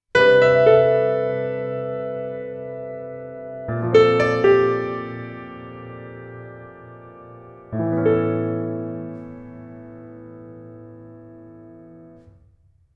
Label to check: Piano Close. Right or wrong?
Right